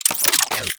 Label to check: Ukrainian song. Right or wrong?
Wrong